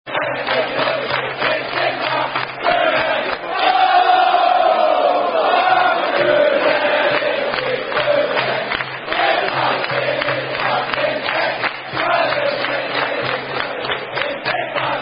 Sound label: Chant; Sydney Fc Vs Urawa Reds - Mark Rudan Chant